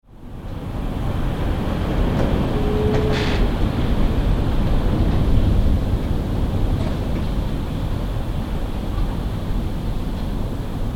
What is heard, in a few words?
Wind Interior Conservatory, Wind against glass some strong gusts; gale